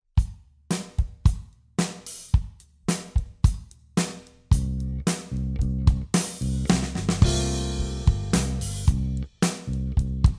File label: Pop, Drum Fills; Essential Drum Fills; Erskine